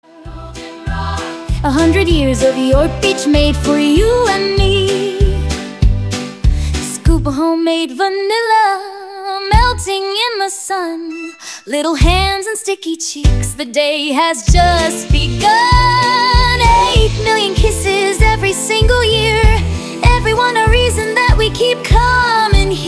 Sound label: instore loop; the goldenrod